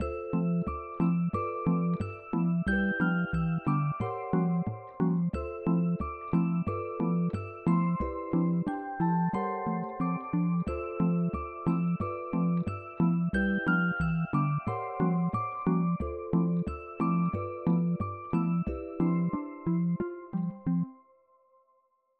Dream Chimes, ringtones; Samsung Galaxy S26 Ultra

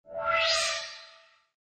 Airplane, msg; LG LG9250 Ellipse

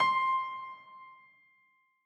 dial tone piano

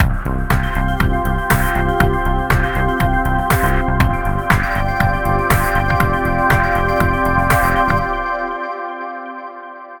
Groovy Step, ringtones; Samsung; Galaxy A5 2016

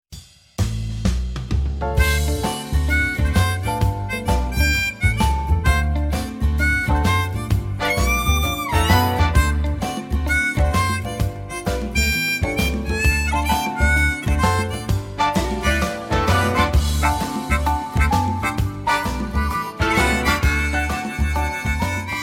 Eexciting; LG G5

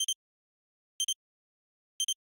selftimer

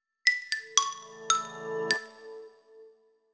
Wakeup tone

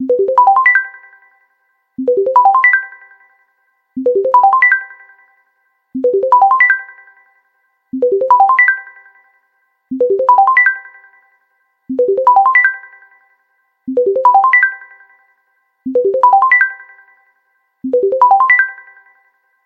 Bubble; LG; A310

